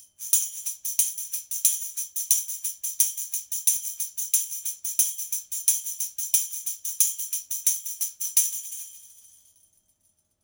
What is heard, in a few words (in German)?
schellenkranz